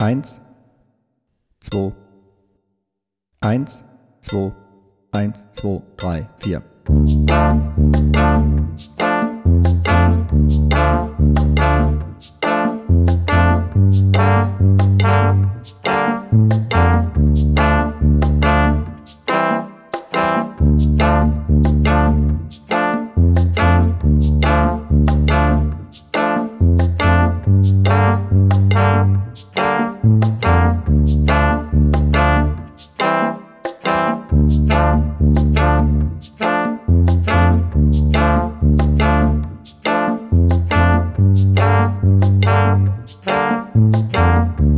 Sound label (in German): ohne Drums